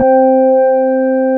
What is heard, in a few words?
ORGANUS C3